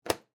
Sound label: Stall Door Latch, Stall, Door, Latch, Lock, Public, Bathroom; Office; Unlock; Public Bathroom Sound Effects; BlastWave